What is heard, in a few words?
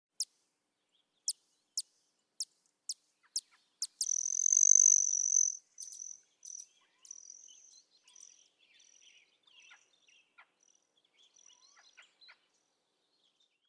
Broad-tailed Hummingbird